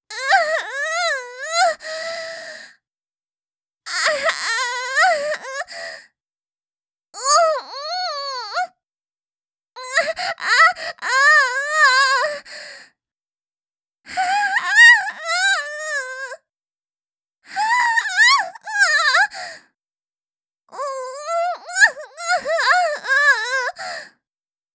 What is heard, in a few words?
High anime moans LONG MOANS